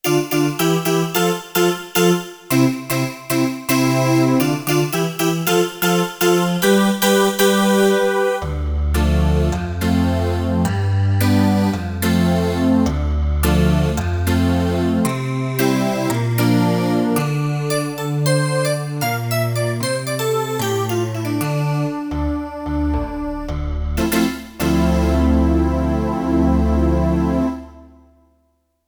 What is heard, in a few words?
Perc-Vox Stack, Roland JD-800; Factory Patches